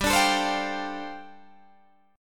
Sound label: G 9th Suspended 4th G down Guitar Open G